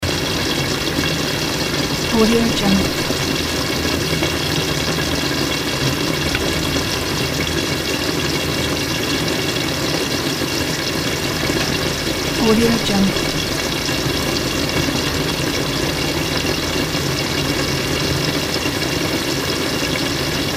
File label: food fried in a pan